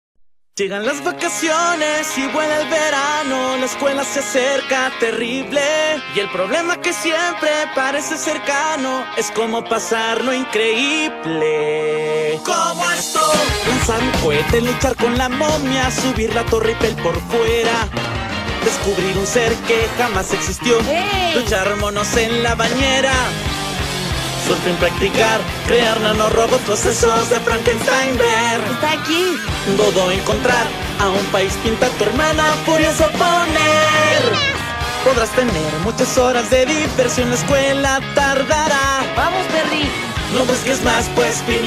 Theme song Latin Spanish; Phineas and Ferb

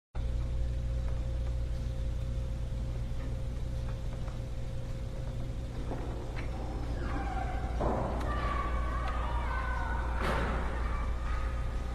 ambience inside house

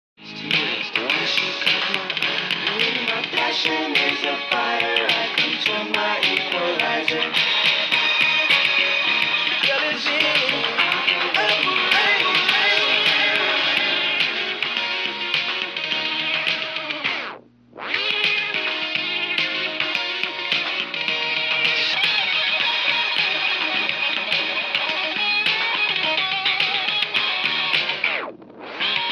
Unknown Boogie Funk; Funk Inconnu

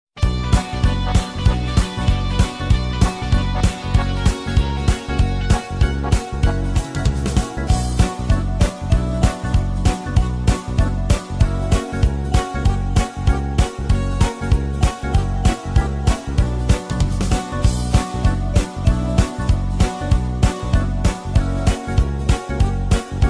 KARAOKE INSTRUMENTAL; Akademia Pana Kleksa

